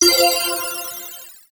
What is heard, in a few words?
win part